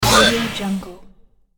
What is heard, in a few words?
monster death hit